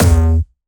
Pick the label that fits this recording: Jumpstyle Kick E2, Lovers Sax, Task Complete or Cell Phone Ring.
Jumpstyle Kick E2